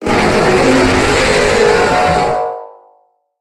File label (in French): Cri Méga